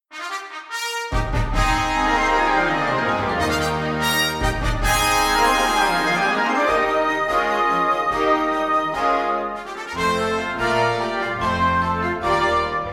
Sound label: fanfare; divertimento I